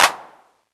clap one shot mid classic, Classic Chicago House; Sample Magic; Drum Hits; claps

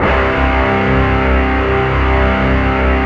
SYNTH GUIT; E-MU Formula 4000 Series Vol. 2

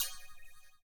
CYM, Ethno Techno; ILIO - Ethno Techno

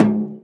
Percussions; Vengeance - Essential Clubsounds Vol.4

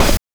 OH; NES DPCM; MIDINES

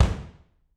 BD, Drums; Bob Clearmountain Drums I

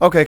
VOCALS; Ueberschall; Jam Box

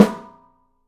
OPEN PICOLO, 3D Drums

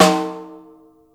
NO SNARE; InVision Interactive; Lightware VOL-1

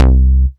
Basses Root C

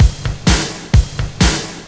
Straight Loop 128 BPM, House

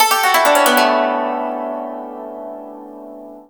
YANG CHING, Heart of Asia; Spectrasonics

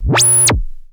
Monotron Sci-Fi

